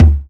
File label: Tom MPC; Drums